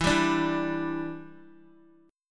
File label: E Minor 7th, down, Guitar; Em7; strummed